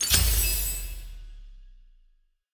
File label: walking Wild Expand; wilderland